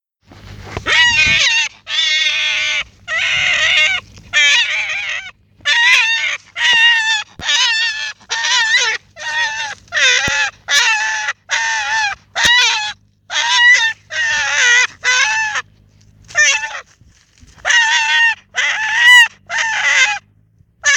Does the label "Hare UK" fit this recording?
Yes